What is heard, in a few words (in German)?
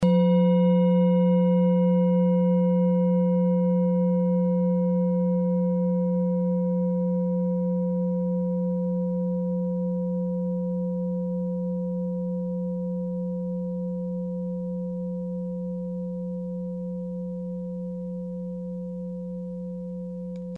klangschale orissa